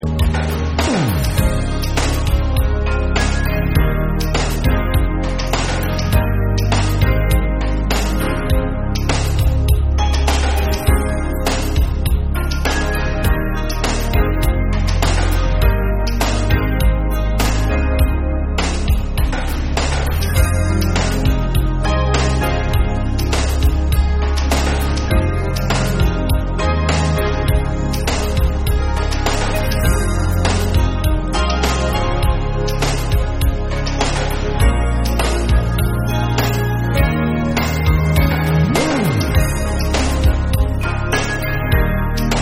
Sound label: Instrumental Version